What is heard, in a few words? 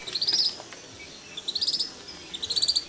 bird sound